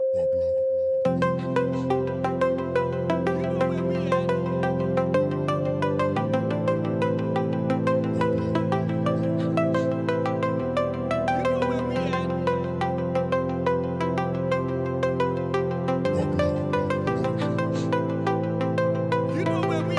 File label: POP EDM